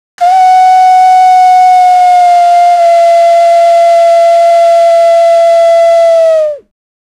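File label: low pitch Victorian whistle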